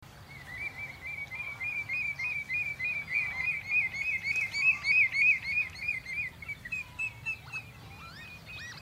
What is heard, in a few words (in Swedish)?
rödbena